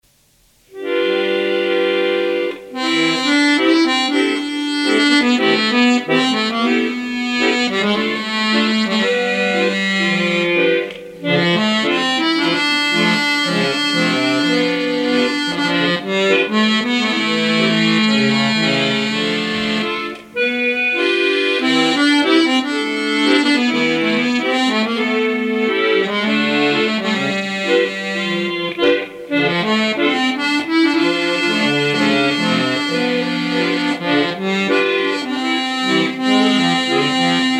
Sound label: chorus reverb